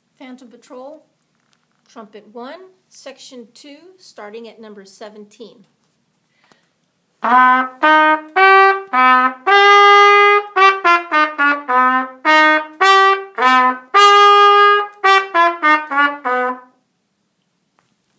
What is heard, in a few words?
Trumpet; Cornet